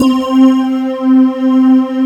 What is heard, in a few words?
BELL VOX, Roland JV80; Rubber Chicken Software Sample Bank